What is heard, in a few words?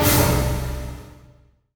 FULL ORCA C; East West; Symphonic Adventures